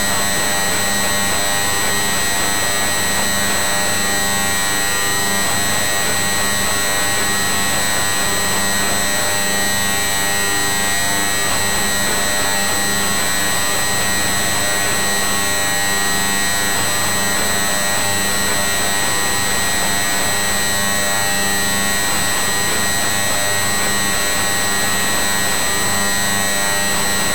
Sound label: RF tone modulation directly from radio; This recoding was made directly from the radio receiver.; These results were recorded from the wall where the tone was projected into via the radio receiver.